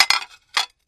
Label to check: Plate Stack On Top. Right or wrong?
Right